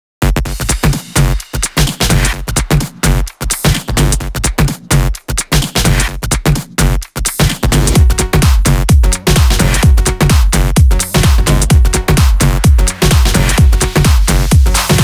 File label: 128BPM Big Floor Groove Mix; Vengeance Samples